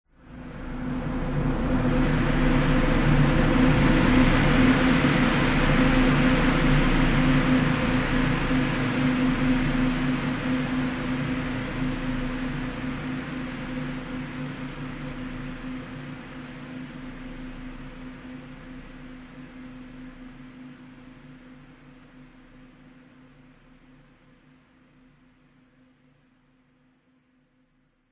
horror atmospheric dark desolate airy swell long reverb; zapsplat